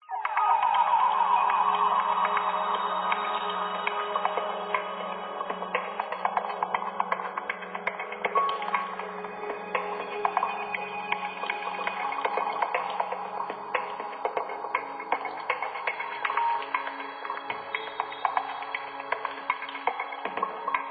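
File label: Music Ambient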